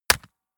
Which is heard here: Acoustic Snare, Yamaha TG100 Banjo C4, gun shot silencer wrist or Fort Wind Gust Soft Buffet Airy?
gun shot silencer wrist